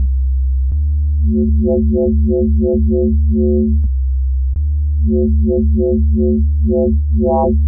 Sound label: Sinoid Fold